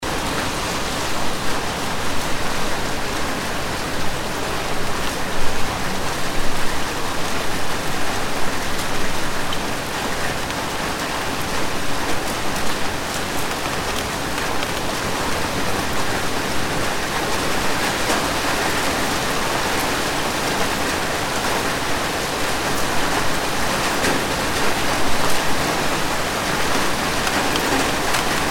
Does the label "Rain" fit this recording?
Yes